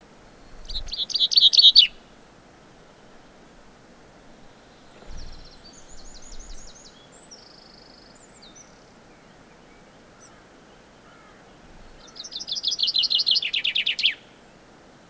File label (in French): Pinson des arbres; Issaux